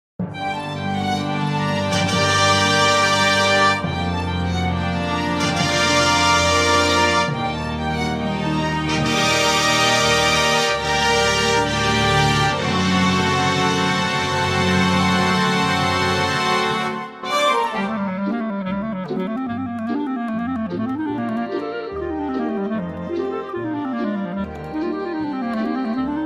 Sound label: Classical Version with Clarinet full tempo 145BPM; Moto Perpetuo